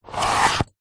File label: foley shoe high heel take off; zapsplat